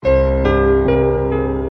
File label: Vivo Notification Ringtone; iQOO 12